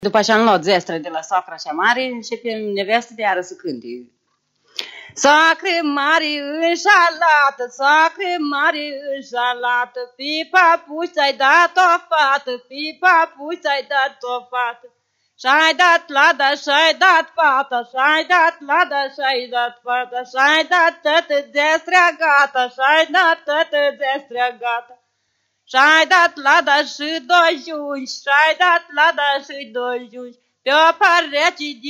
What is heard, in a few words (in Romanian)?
Strigaturi dupa zestre; Vaslui